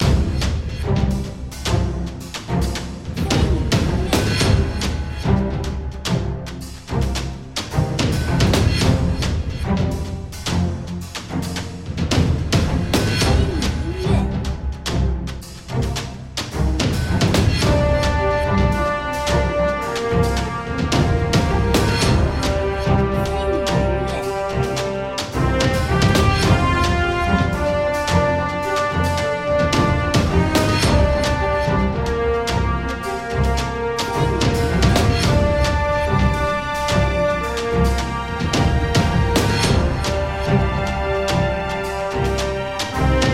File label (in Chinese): 野外